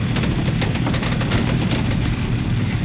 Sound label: wood, Impact; Cars; XBOX